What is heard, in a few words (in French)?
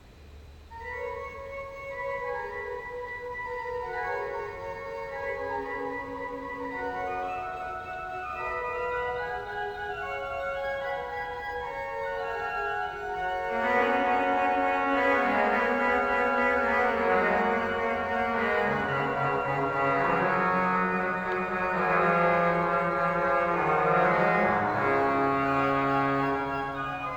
trompette; dandrieu